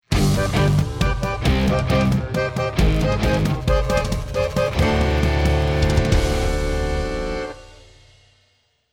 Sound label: round mvp anthem